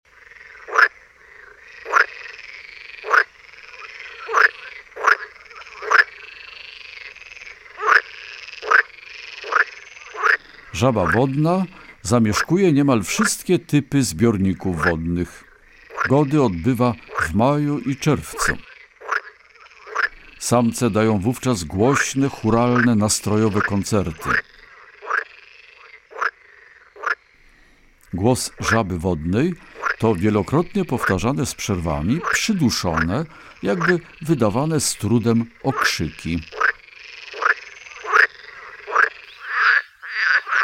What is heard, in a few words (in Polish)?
Zaba wodna; Kurs PTTK; Przyroda; Tajemnice puszczy